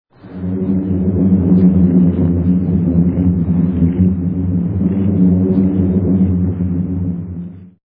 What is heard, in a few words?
boom